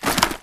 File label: hack spectre armorrattle; dataknife; Titanfall 2